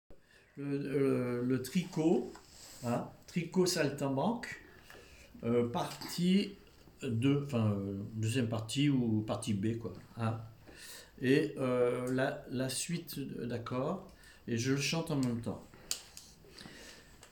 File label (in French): accords partie 2; Tricot-Saltimbanque-HoraDeRadaouti